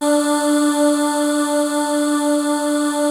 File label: ITOPIC D3, SYNTHVOICES; Music Store - Claudius Diemeter Schwerlos